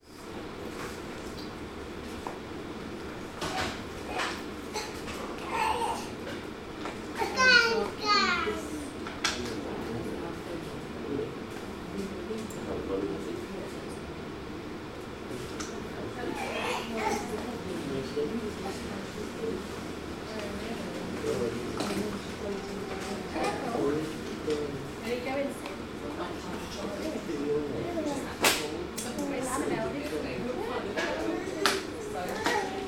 ambience hospital waiting room childrens dept uk people chat children play quiet